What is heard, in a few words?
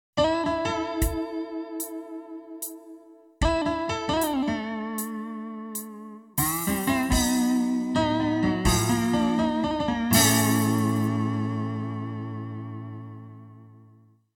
guitar